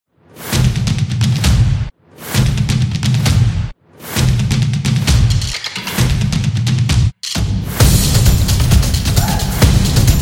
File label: Race Track, football music